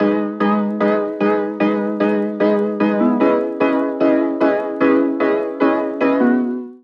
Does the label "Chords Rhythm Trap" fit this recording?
Yes